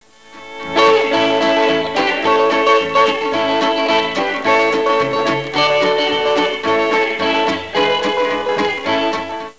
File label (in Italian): musica strumentale; instrumental